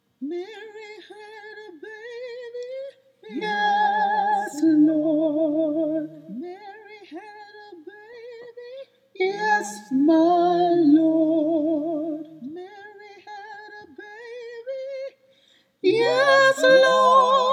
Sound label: mary had a baby alto